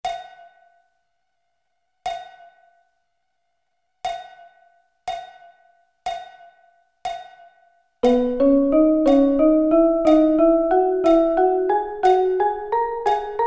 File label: B Double Sticking